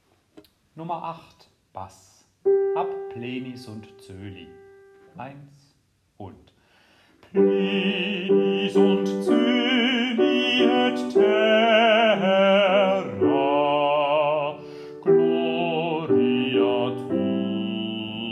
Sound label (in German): Bass; Dioezesan Caecilien Verband; DCV Chorfahrt; Übaufnahmen